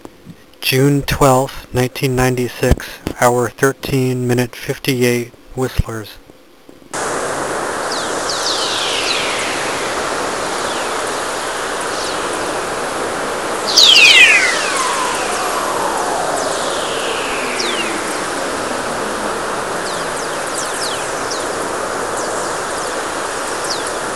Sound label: whistle, plasma wave